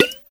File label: Menu Back